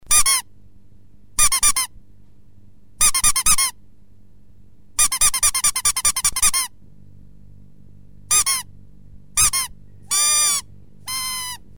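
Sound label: sound rubber duck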